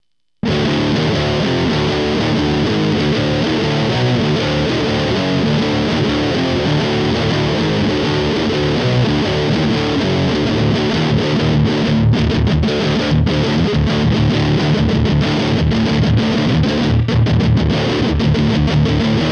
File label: amp jcm2000